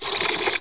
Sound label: Gear Move; Bible Fun Pack; PARSONS